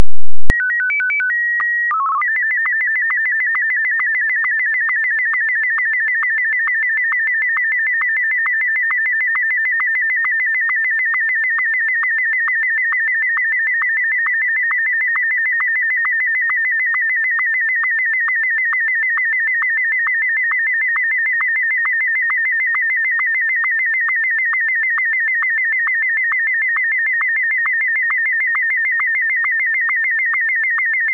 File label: sstv; 22050 Hz, mono; 320×256